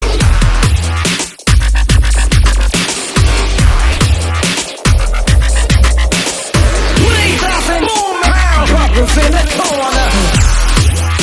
Popping Beats, percussive pops

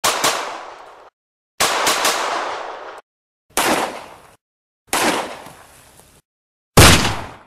Glock Sound, ballistic

